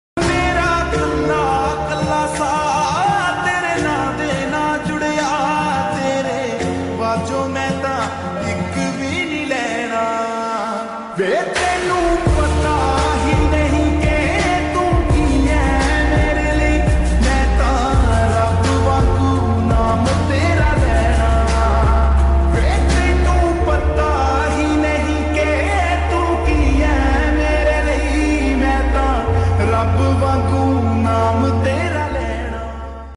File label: romantic punjabi